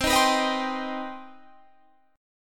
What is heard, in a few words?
C Minor Major 7th C Guitar Open G